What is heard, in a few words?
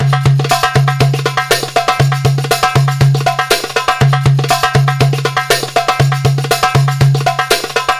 PERLP, ArabianTraditions